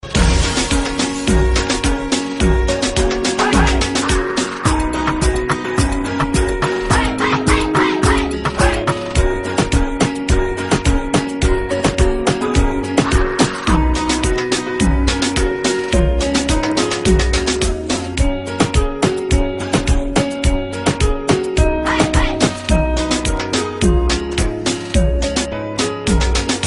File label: navidad reggaeton